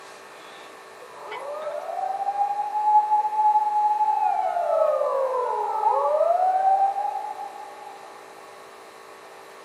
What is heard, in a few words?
siren police